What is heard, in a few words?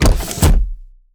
step; Garry's Mod; labubu mecha